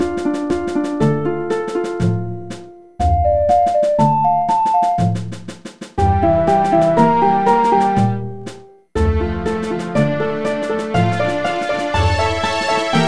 bolero; RPG Maker; Legend of Heroen v1.20

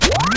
Garfio; RPG Maker; Legend of Heroen v1.20

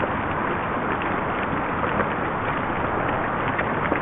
lluvia interior; RPG Maker; Legend of Heroen v1.20

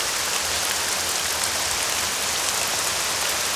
Lluvia; RPG Maker; Legend of Heroen v1.20

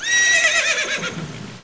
Epona grito; RPG Maker; Legend of Heroen v1.20

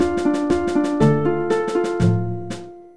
bolero; RPG Maker; Legend of Heroen v1.20

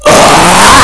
MUERTE; RPG Maker; Legend of Heroen v1.20

